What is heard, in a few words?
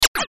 Squeaks Short, Foley Squeak Metal Hinge Short Fast; Squeak Sound Effects; Squeaks (Sound Effects:Objects)